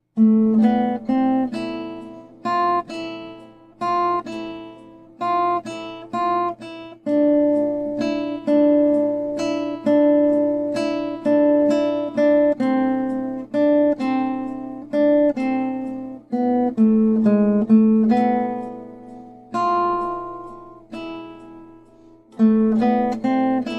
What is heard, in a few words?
Guitar